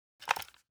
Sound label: rattle rifle; gmod; arccw uc commons attachments